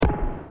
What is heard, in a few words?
perc spring verb; putney; freshmeshdemo